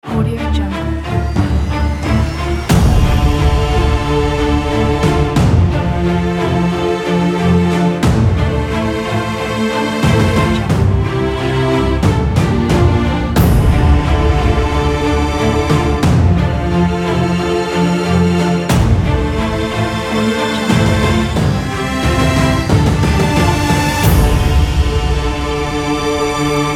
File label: epic cinematic ident